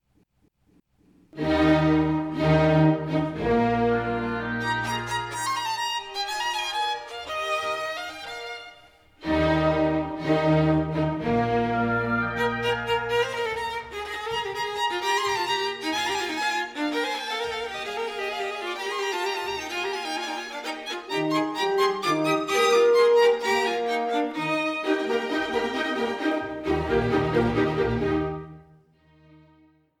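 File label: Violin Viola Sinf Con B flat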